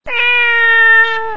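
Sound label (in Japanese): neko, cat; 着信音やアラーム（目覚まし）音に使える、着モーションとして; FOMA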